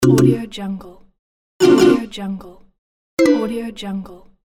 wrong move

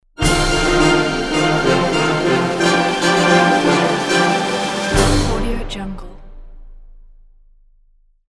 cartoon animation cues logo; pinkzebra

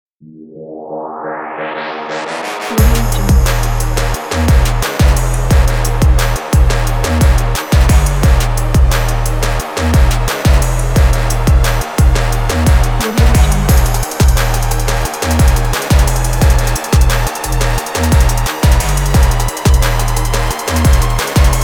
this is trap; eliansproductions

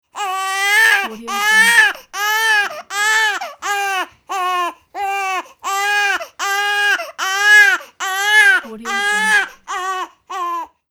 baby crying

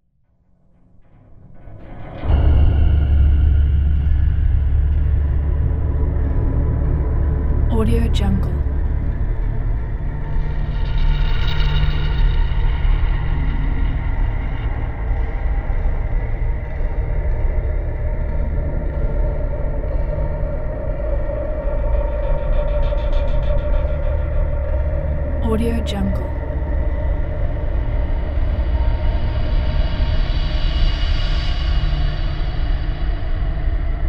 dark atmosphere sound

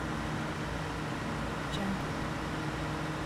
train station multiple diesel trains idling loop; stormwaveaudio

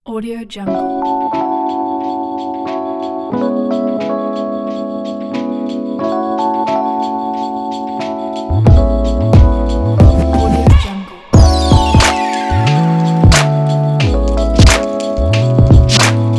fashion soul

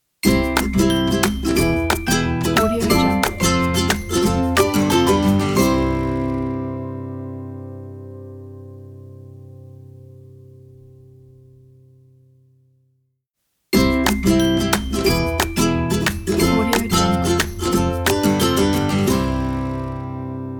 funny logo

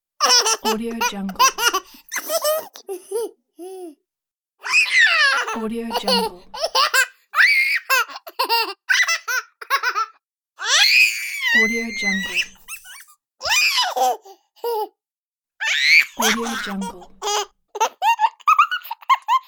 cute toddler laugh pack

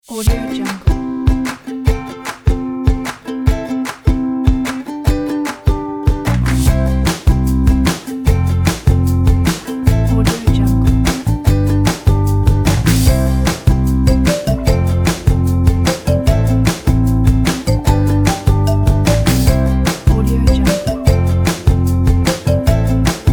be happy

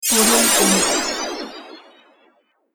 magic shimmer spell

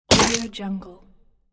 swat squish of mosquito fly; wistansound

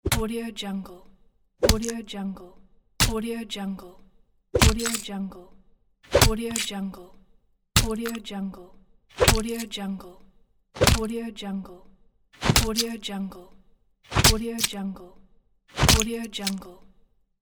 killing dagger; creatorium-sound-design

